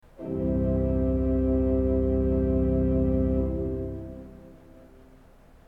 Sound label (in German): ton, orgel